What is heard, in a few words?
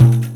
Tar Hit; Drum One Shots; Percussion; Ambient Grooves